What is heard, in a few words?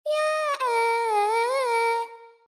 pitch bend tutorial gumi separate notes